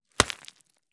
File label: Ice Blocks impact thwack fine shatter pieces scatter